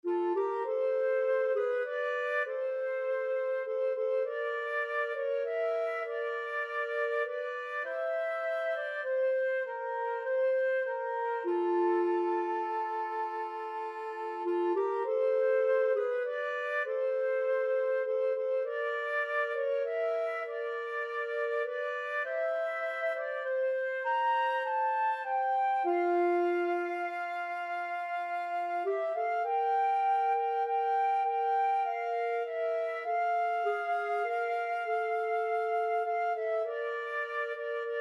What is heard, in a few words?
rugged cross, flute clarinet duet